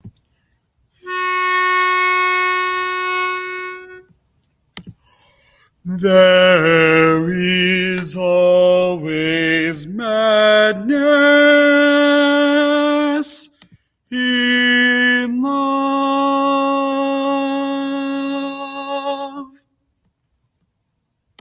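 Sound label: Baritone, barbershop tags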